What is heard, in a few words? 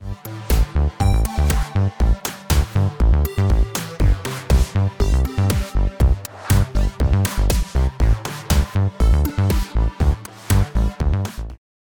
Rapid Loop Constructed; Parawave